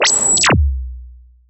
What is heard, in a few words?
Zap Laser Blast; bottlerocket